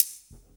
SD RIM, RIM SHOTS; Best Service; Dance Mega Drums

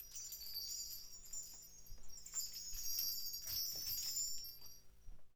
BELLTREE

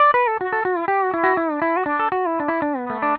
PD LOOPS 120, Whole Lotta Country

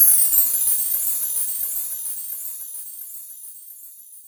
kit, Yamaha Motif-ES8

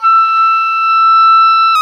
oboe, Roland Orchestral Winds

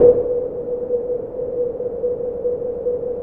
SONAR; Music Store Sound Service; Einstein

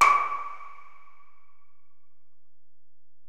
EPerc, Alesis DM-PRO

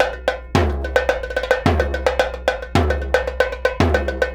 110 BONGO, Percussion Loops; BONGOS

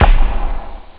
Ending kick; jazz jackrabbit 2

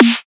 Hard Snare; Drums; Antidote Advent

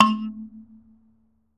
PERC, Dance Mega Drums; Best Service; PERC MIX

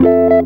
EGUIT CHORDS; Best Service; World Colours

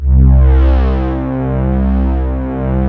PAD BRASS C2, Analog Fantasies; USB Soundscan vol.49; AKAI

